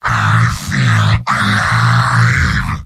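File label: positive vocalization, male; heavy; tf2; garrysmod; mvm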